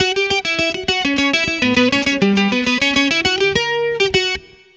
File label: guitar, stringed instruments